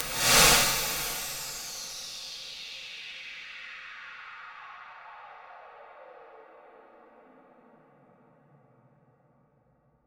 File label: bloom, reverb